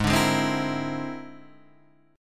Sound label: A sharp 9th Flat 5th G sharp, Guitar